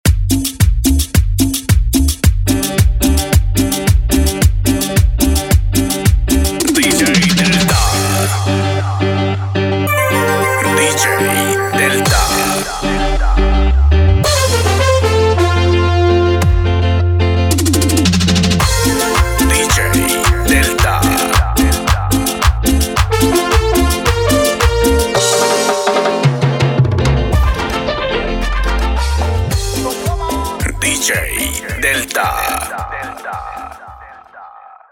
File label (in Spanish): ENTRADA GUITARRA; SONIDO MASTER DJ DELTA PRO